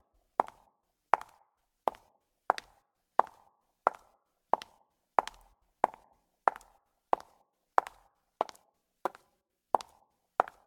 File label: footsteps heels loop; Film Noir; SoundPad